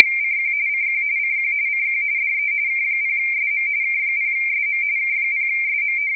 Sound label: 512 tap waveshaped bpf